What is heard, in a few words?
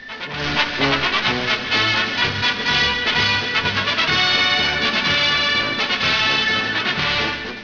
dixie